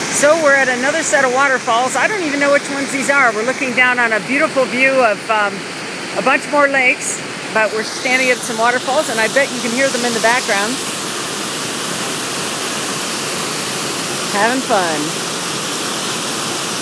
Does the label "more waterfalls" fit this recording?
Yes